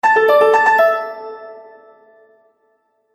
Bright Melody Mobile App; Musical

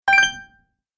Great Idea, cartoon

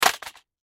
Crash Plastic Object Drop Fall; Blastwave

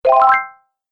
Classic Bonus Points, video game sound effects

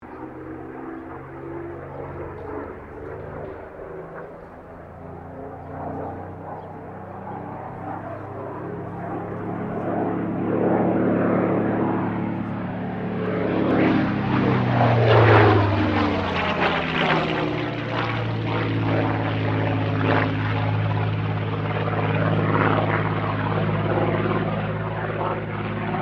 AIRCRAFT SUPERMARINE SPITFIRE MK-VIII PASS; Airplanes; Vehicles

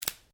Branches Dead Snap

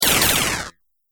Science Fiction Laser Shooting, laser gun; Laser Sound Effect; Sci-Fi Laser Sound Effect

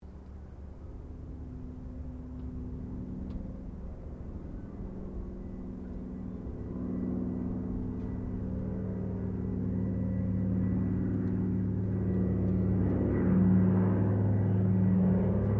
AIRCRAFT DOUGLAS DC3 PASS; Qantas 1942 DC3; Airplane; Airplanes; Vehicles

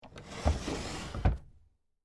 Drawer Close Desk, Antique, Wood, Small; Foley; Drawers

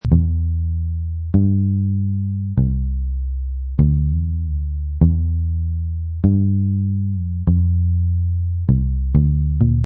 Muted Bass Em 98

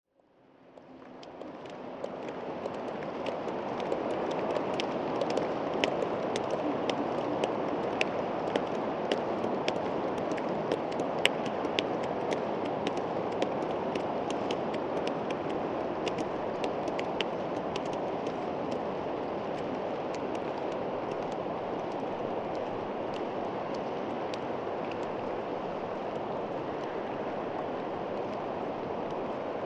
Applause in cathedral at end of recital; very long with various performers taking bows

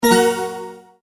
multimedia tone end

